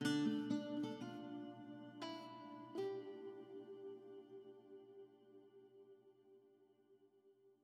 Harp

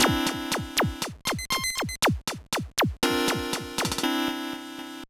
Jingle, GBA; No No No Puzzle Chailien

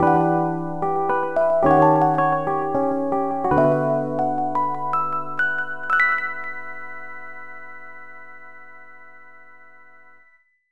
Jingle Next Day; Hello Baby; NDS

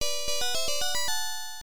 Fanfare, msx2 fm pac; Compile; Blaster Burn Budruga Episode III

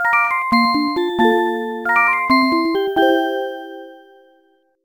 Save (Happiness Jingle); rune factory 3 nds

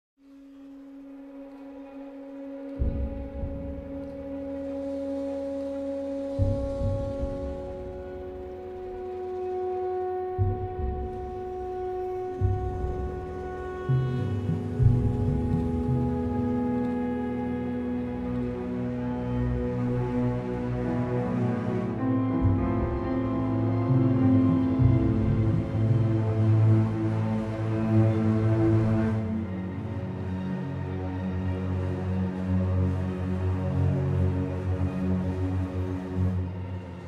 World Music

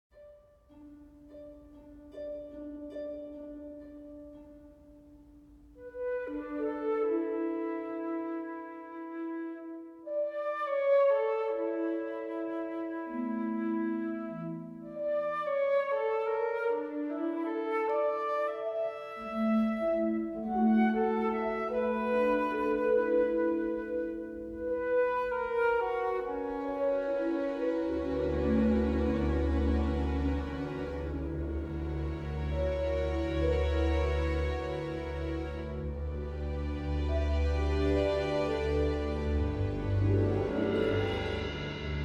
World Music

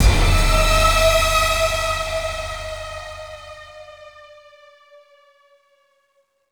Sting; Stronghold

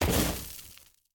Burnt; boomerang fu

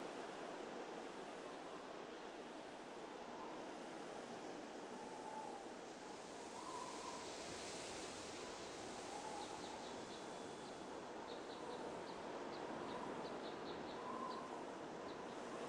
Day Snow Amb

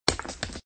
stone break

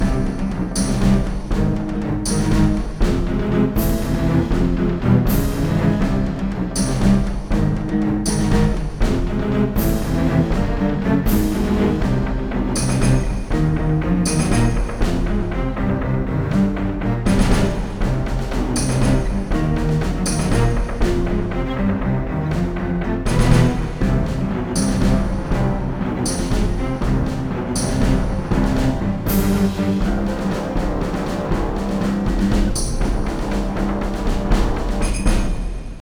Boss Duel Music Bad

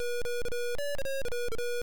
Die by drowning; River Patrol; arcade

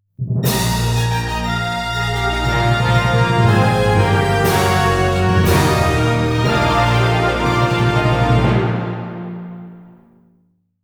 Victory Jingle

